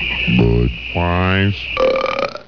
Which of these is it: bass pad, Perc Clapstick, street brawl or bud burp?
bud burp